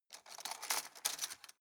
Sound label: cutlery plastic drawer rattle